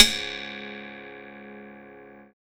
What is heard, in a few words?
XTRA PERC